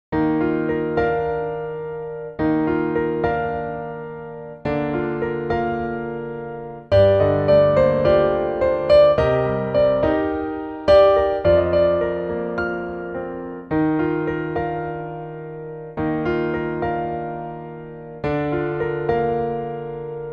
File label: Bb piano